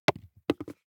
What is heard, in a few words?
DICE on Wooden Tray, Throw and Roll, Foam, 1 One Die; Samples; ArticulatedSounds; DICE Sfx Party (Extended)